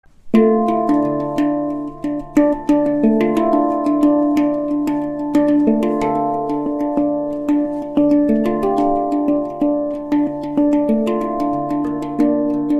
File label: Hang drum Test Sound